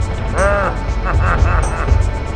thwomp laugh us

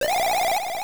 spin jump

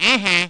eh heh; luigi

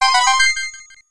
red coin

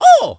mario ow; smsunshine

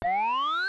balloon get; smw

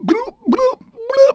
drowning; mario; sm64

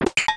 countdown platform; smw2

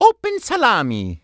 mario open salami; smsunshine

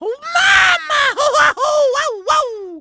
burned; mario; smsunshine

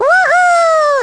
baby woohoo; baby mario

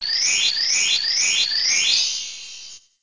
1-up appears

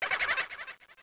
boo; sma4

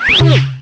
drop into course

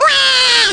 baby cry; baby luigi

